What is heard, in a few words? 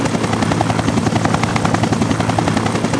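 helicopter loop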